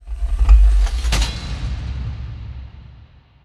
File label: wheel end; ui; economy